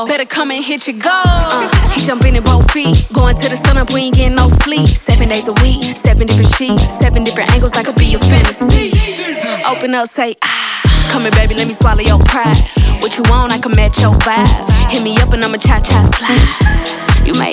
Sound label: Ringtone